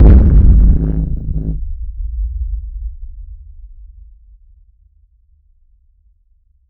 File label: a dull subsonic fleshy